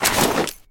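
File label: noisy fall of a backpack torn from the weight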